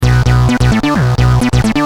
Driven, Bass; Industrial

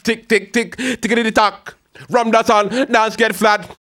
HAL RAGGAM, RAGGA-MUFF A maj

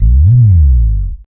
Bass Slide FX, Roland BK-5; Loops & Phrases

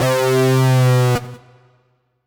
Lead Synth Lick; Riffs & Solos; Dr. Fink's Funk Factory

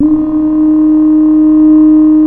beginloop, synth

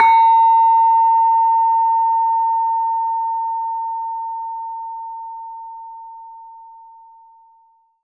LAMEL A4 -R; USB Soundscan vol.60; AKAI; LAMELOFO

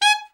ALT SRT, ALTSAX SHORT; Giga Samples Collection

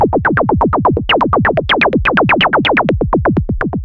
SH101 Clocked LFO Rhythm (125BPM)

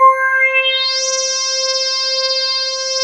BELL SWEEP

